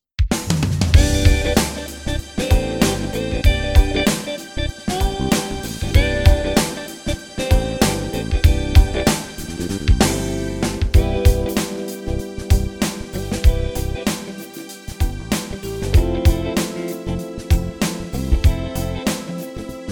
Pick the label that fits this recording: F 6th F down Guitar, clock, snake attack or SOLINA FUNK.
SOLINA FUNK